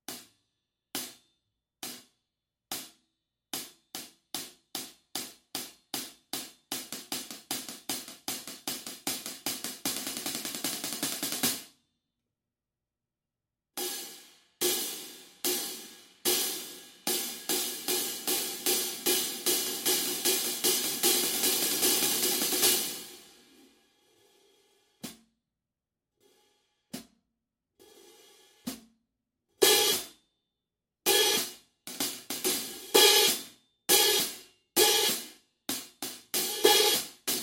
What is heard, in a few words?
Epic Hats, cymbals